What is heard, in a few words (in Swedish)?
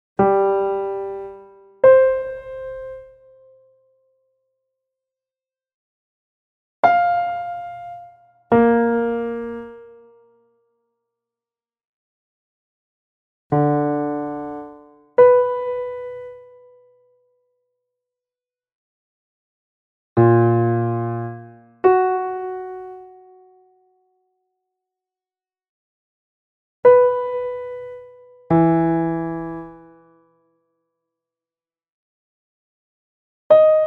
Vilken ton är ljusast Ganska stora intervall